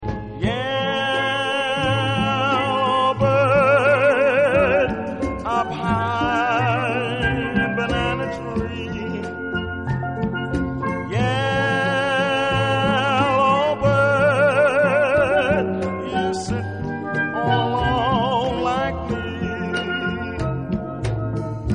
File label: Steelband; Igniters Steelband